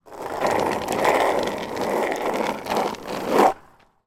sport skateboard skate short then kick up wheel spins